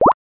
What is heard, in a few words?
Beep Sine